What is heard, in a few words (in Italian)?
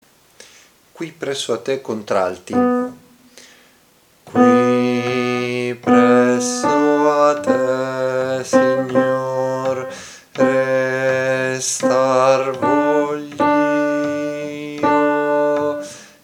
contralti; Qui presso a te